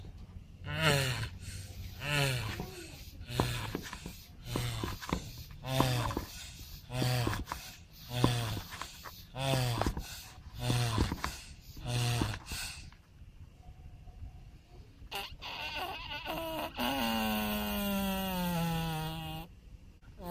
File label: Turtle Sex Grunts